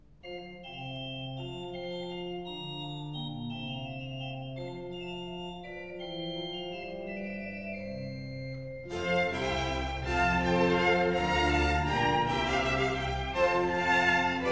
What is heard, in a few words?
Concerto in F major (4 violins & cello); Vivaldi